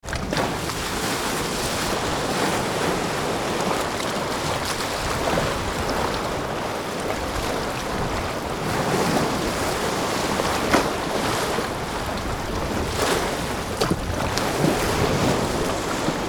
lake breakers calm, Waves Break On The Rocks, Lake Michigan; Small Waves Break On Rocks; Nature; Lakes